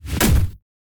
kick light

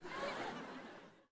people studio laugh small

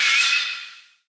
hero, anime

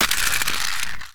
handgun blown away

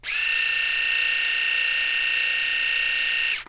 shaving operation, machine

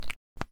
magic cap close

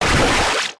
katana slash

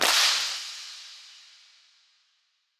whip attack

